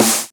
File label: snare spinning